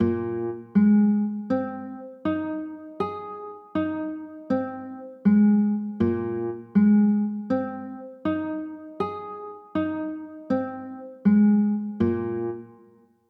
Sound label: b flat 9, chords; b flat 11